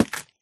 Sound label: strip, axe; Minecraft